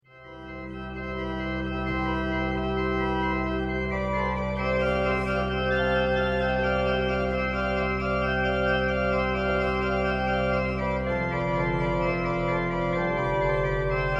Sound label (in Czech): varhan na kůru; je mechanický, má jeden manuál a 8 rejstříků; Žlutice; Do Žlutic byl přenesen z pražského Břevnova, kam se dostal z kostela v Brložci